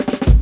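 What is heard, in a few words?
drum roll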